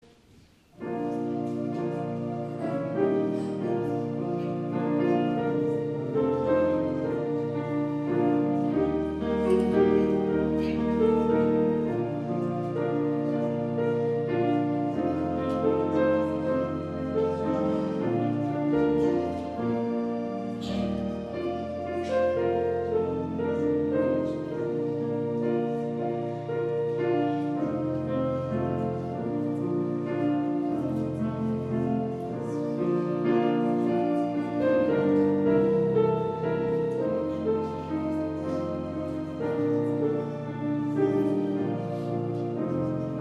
Vorspiel

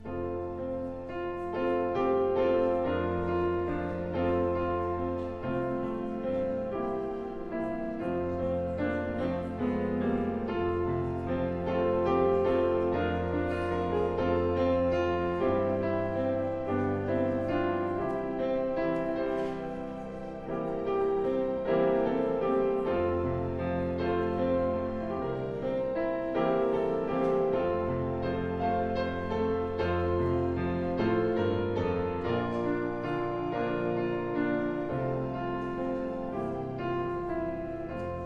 Vorspiel

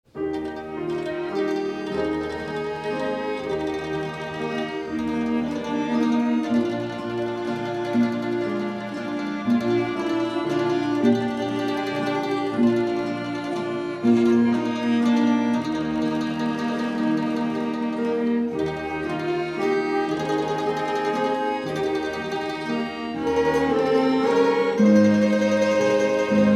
Vorspiel

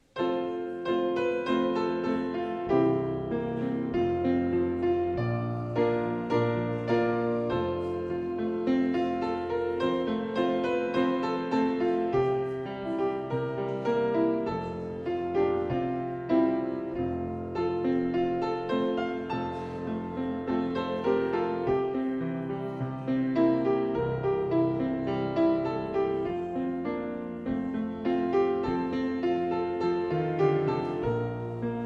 Vorspiel

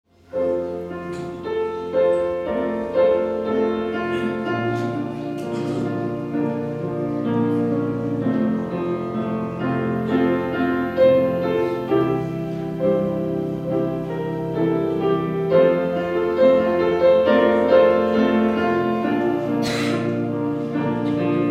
Vorspiel

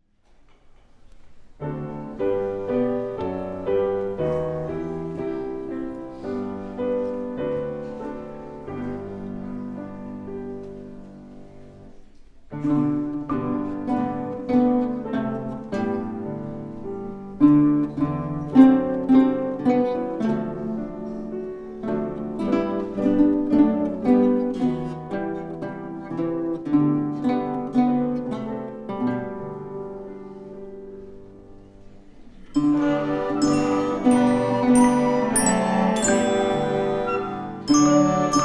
Vorspiel Kinderorchester; Solang mein Jesus lebt

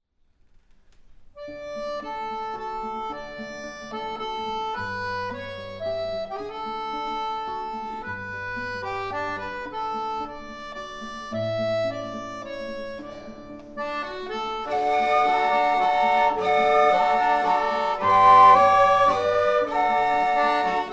Vorspiel Instrumentalgruppe

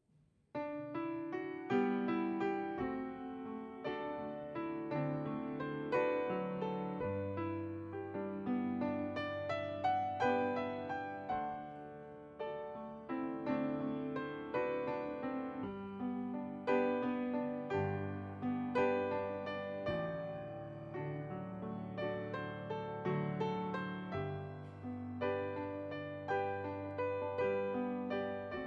Vorspiel

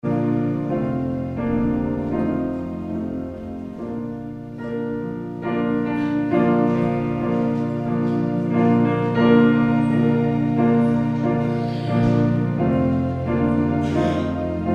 Vorspiel

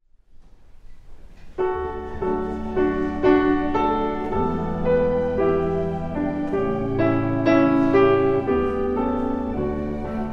Vorspiel

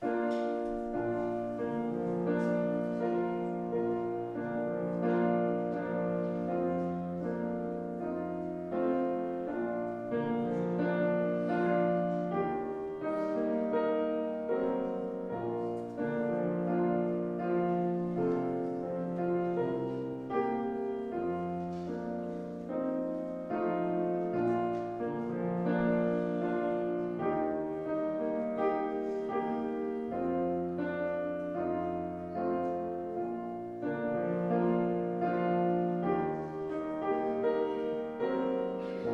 Vorspiel

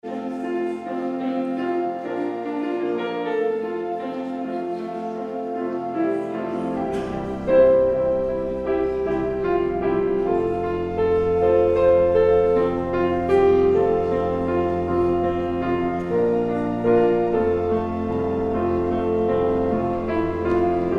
Vorspiel

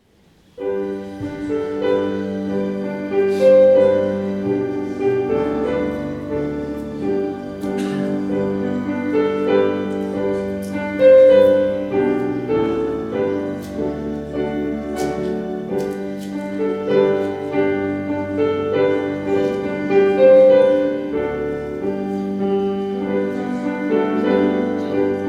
Vorspiel

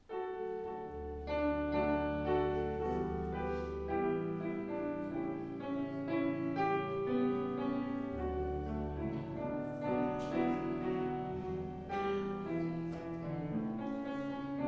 Vorspiel

